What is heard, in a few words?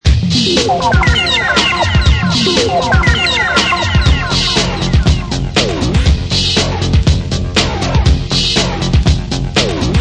breakbeats